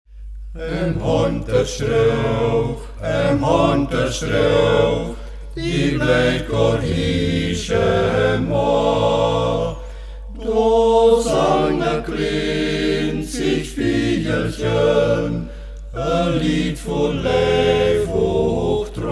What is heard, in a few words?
oktett, Mediasch; Ortsmundart: Mediasch; Äm Honterstreoch; Mediascher Oktett; Hegt wird gesangen